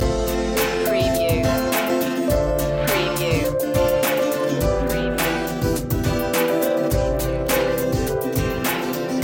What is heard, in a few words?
summer picnic loop